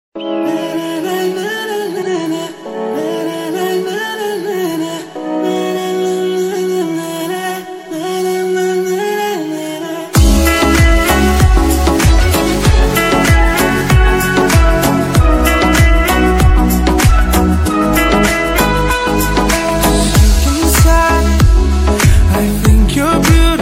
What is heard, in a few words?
Ringtone